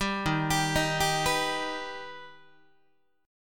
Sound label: Eb Augmented Eb, Guitar; arpeggiated; 4A,4E,5A,5D,5G#,6C#